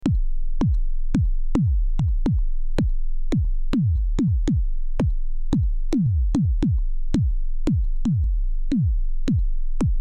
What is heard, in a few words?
switchable bassdrum; soma the pipe